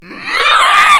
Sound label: pain, slender shiver